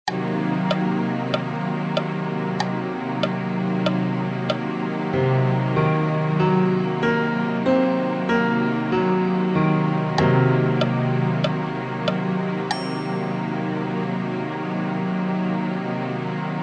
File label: min7b5